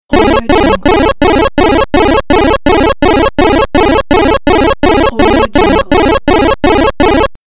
scoring points retro tally

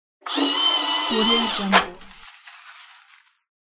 automatic paper towel dispenser

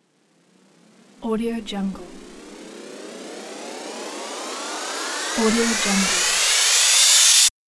riser

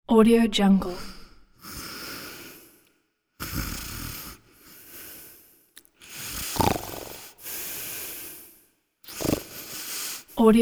snore

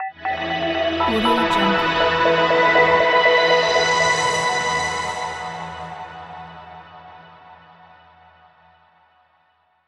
computer startup logo

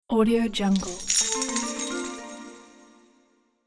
treasure gold revealed

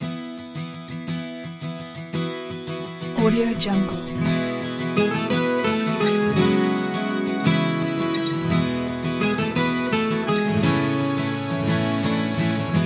celtic journey

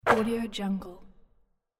net swoosh

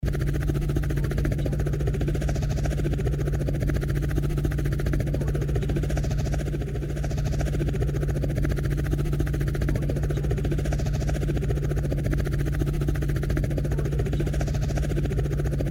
helicopter

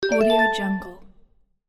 announcement

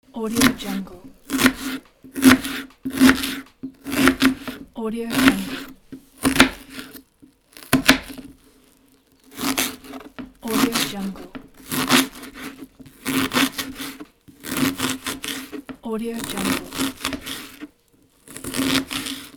cutting vegetables

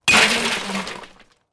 glass bottle breaks on iron barrel; soundcreator

